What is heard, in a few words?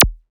perc classic zap